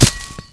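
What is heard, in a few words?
grass; Mafie 1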